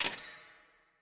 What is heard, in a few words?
Use; Lucius III